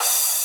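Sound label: drum crash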